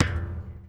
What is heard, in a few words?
Street Ball Metal Back; SFX Kit